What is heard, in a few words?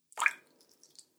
Water Splish; Splash Sound Effects; Royalty Free Water Sound Effect - Splish, Splash, Splosh!; Agcnf Media